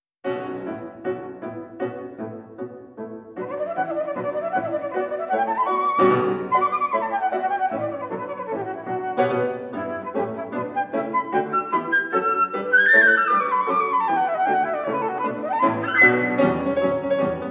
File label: Flute Sonatina; HandFluteSonatina3clip